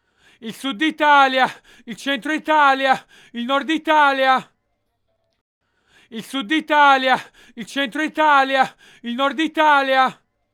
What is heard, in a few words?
refrain (effect telephone megaphone)